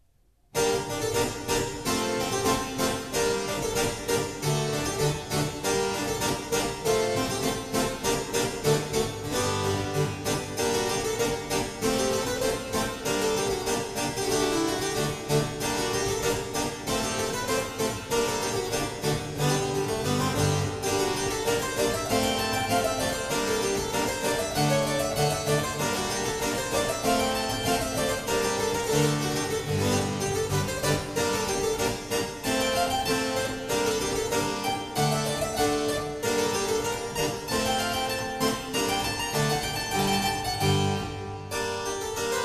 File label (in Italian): Ballo dell'Intorcia cembalo; Storace